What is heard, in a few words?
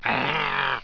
growl; attack; animal